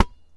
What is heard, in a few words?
Street Ball Rebound Grab; SFX Kit; Street Basketball